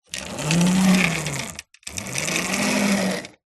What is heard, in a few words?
toys lego vehicle roll wood rubber tyres